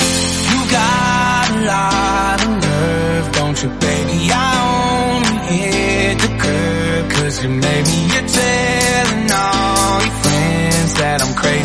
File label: House, 126 bpm C